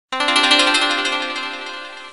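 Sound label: string sms message on htc cool sms ringtone; strunnyy sms soobschenie na htc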